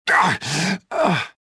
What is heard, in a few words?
combat; reborn